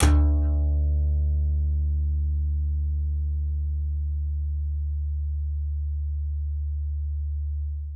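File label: acoustic bass slap D2, right; Masterbits CLIMAX 7 - Bass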